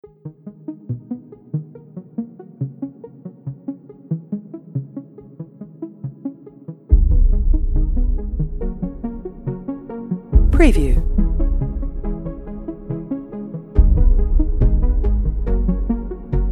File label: Cinematic Sci-Fi Cyberpunk Futuristic Arps Synths Melodic Soundtrack